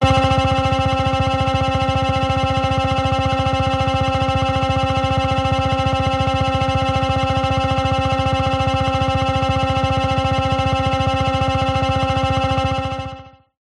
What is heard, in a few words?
Drones Synth Laser Drone; sound spark